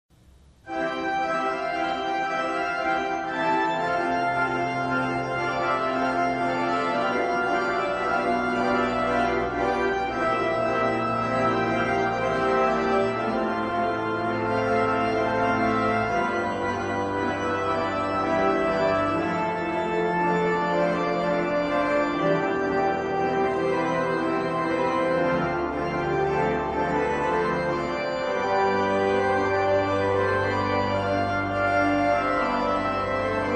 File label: Organ solo